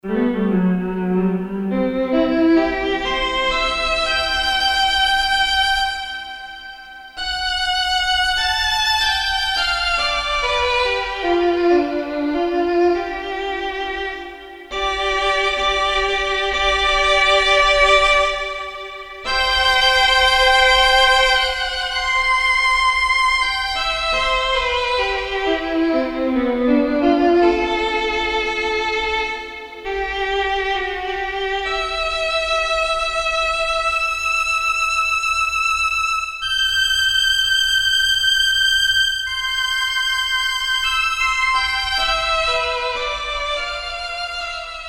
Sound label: violin, Korg DSS-1 strings